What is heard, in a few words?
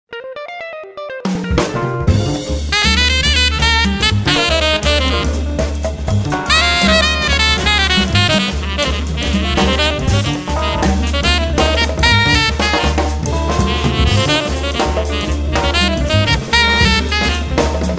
Jazz Bar; Samsung; SGH-T929 Memoir